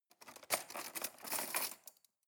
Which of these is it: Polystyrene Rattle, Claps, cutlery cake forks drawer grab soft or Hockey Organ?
cutlery cake forks drawer grab soft